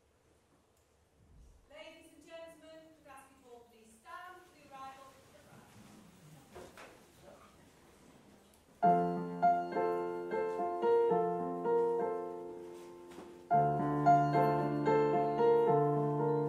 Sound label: Solo Piano